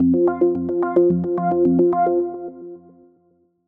short news logo

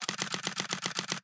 Camera DSLR Shutter Burst

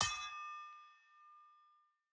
Sword Clang; soundscrate

